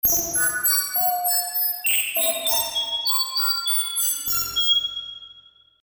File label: Sci-Fi